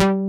RUB BASS; Pro-Rec - Synthsonic; BASSES

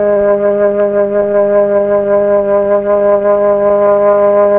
FLT, Melotron Flt, Tron Flutes

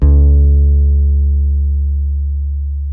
H. FLS D#3, Bass; Spectrasonics; Bass Legends

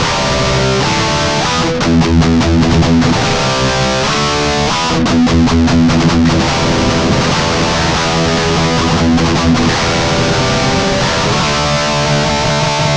Guitar Licks

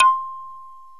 BOTL MARIMBA; OMI - Universe of Sounds

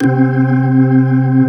C3, Keyboards Old School; USB Soundscan vol.09; AKAI; BIG HAMM

